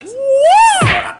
Karate scream

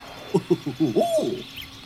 Goofy Giggle

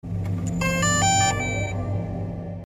DJI drone Startup

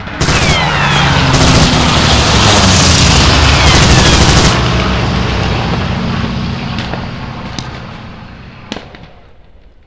Stuka Strafing Run

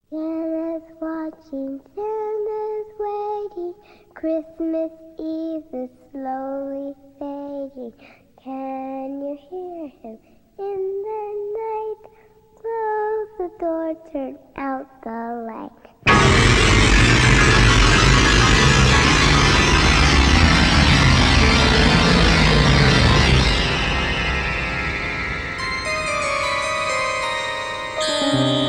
Scary

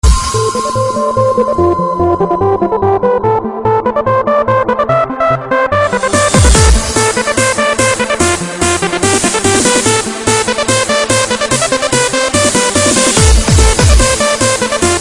Techno Remix; Naruto

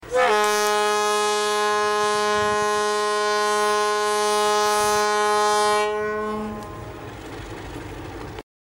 ALCO 321 horn; ADIF

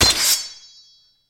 Sword; Land Battle

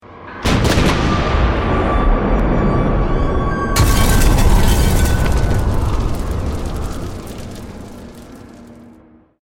Bombs

